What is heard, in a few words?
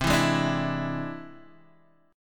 E Augmented 7th C